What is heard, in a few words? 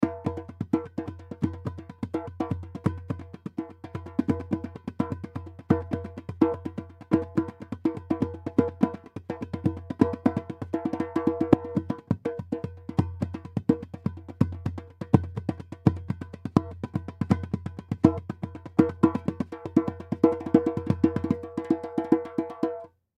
Goombay Drum Rhythm; Drums; Musical; Blastwave